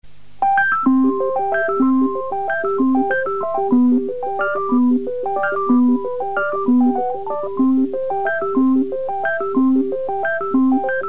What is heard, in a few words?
Bi Kalaam